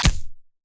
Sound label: shotgun